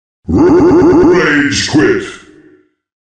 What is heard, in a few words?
rage quit sound effect